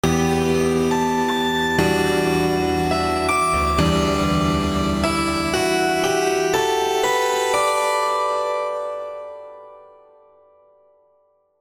12 Bit Buffer Overrun

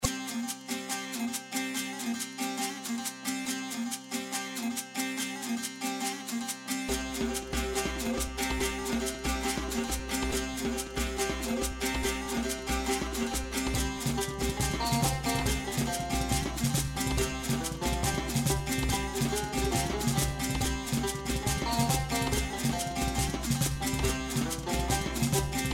Ethno

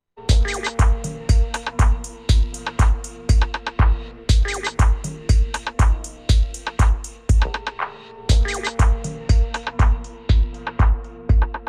Filter Effect; Mixxx V2

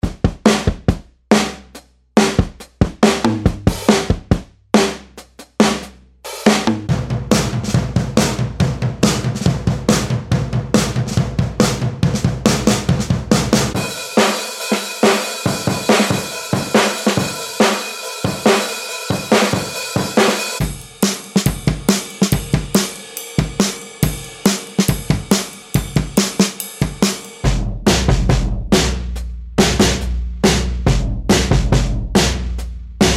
rock n roll

